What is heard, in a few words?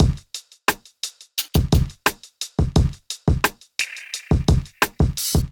Specs Kit 87 bpm, hip-hop drums; MIDI Clips; Golden Era; Sound Oracle